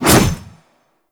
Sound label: Reaper Melee Whoosh; Warframe; Wraith